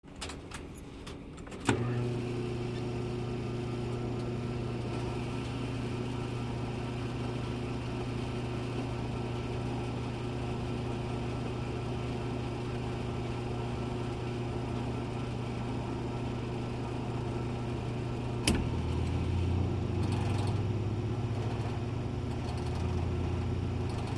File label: Silent machinery hum